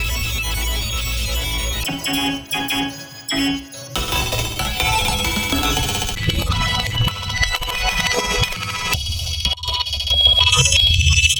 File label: pad cool melodic resample cut E, synths pads; base pack